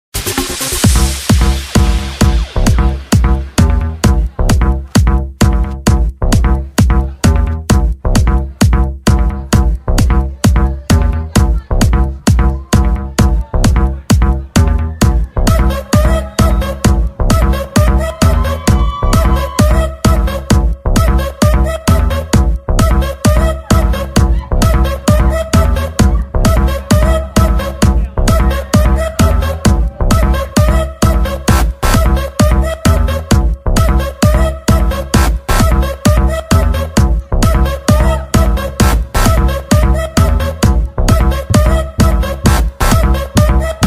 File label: house music trance techno